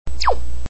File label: Woosh; Super Mario 63